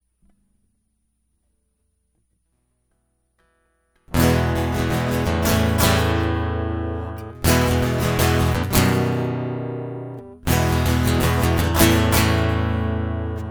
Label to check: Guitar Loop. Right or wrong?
Right